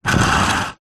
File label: household water sprayer bottle plastic small scrape garage floor